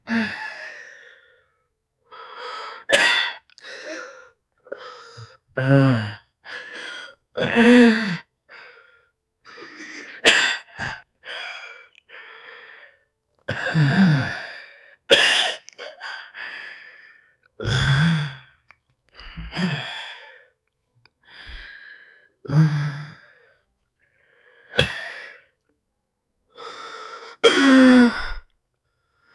18 years old man holding, close mouth

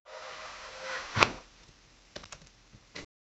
the sound of the transiti, presentation

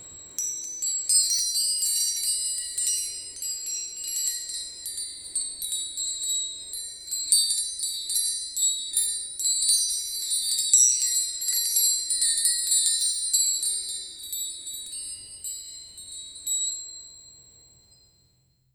burst of light flash, bell chimes

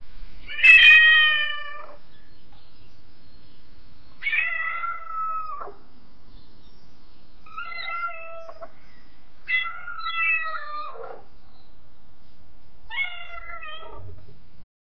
cat trying to reach the, interaction

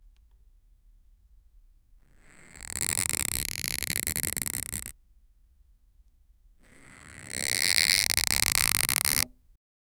string being pulled tight

